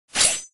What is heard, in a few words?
gob attack